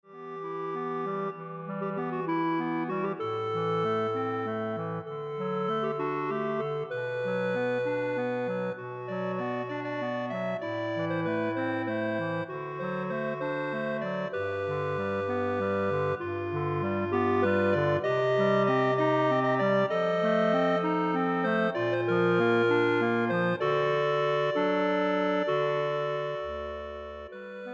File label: Clarinet Ensemble